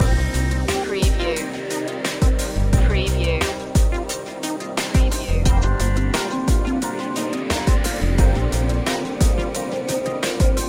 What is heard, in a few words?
over distant shores loop